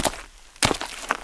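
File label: footsteps; Mafie 1 cz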